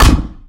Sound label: tank hit small; tanks; War Thunder